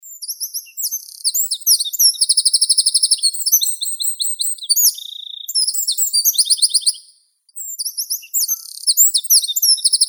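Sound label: wren, Troglodytes troglodytes; krapivnik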